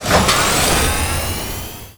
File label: bumper car accelerate; tf2